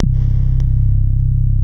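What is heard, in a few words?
wall moog, bass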